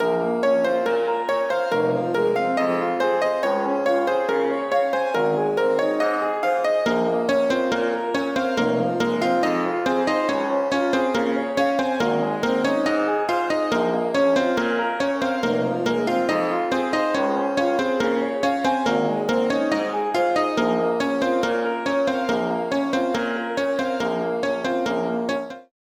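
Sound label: Break Instrumente Full Dry, 140 BPM; Vengeance - Essential Clubsounds Vol.5; Demo Constr Kits; Catch a Plane Kit; Root A#min; Synth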